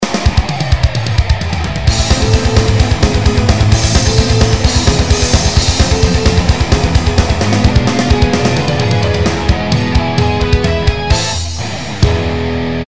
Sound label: Power Up